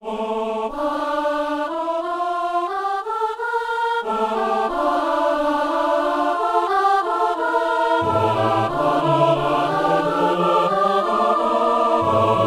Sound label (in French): canon entier; on voit souvent les buveurs